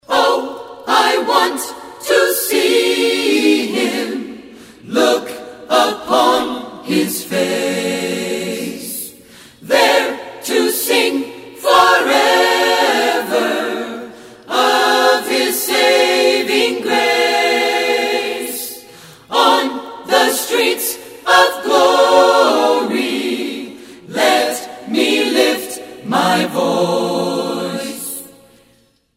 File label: Acapella; Choral